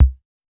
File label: Kick; E-Punk Project Drum Samples; Electronic Drum Samples